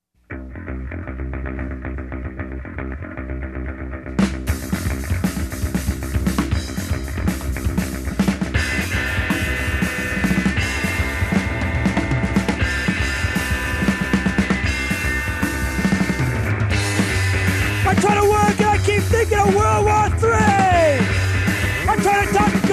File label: paranoid chant